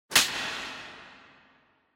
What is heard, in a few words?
Hit Slap, Reverb; 打撃 平手打ち; リバーブ